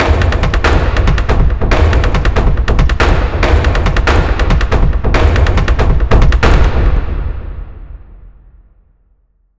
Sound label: Orchestral Drumloop